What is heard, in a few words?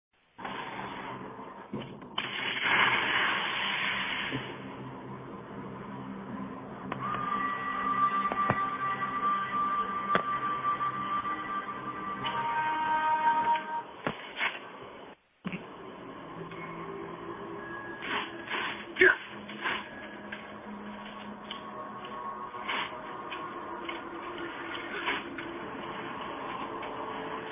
voicemail various gameplay background noise